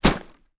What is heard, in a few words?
trash bag full down on hard ground, household